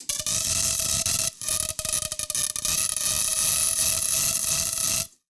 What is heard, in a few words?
Industry Tool Torch Weld